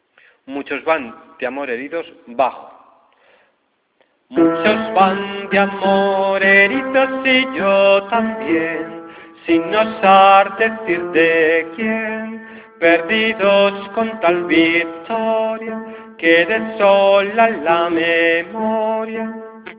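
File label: bass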